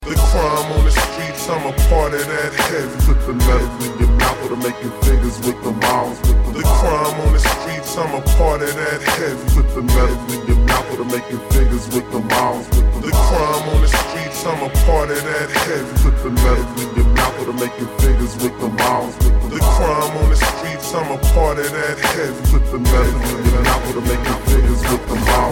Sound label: Heavy